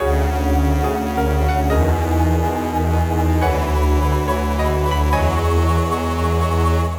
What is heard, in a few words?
BGM STAGE BATTLE; Phantasy Star Zero